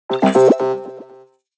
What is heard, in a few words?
Transition SFX